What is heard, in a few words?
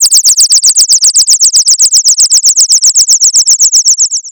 blips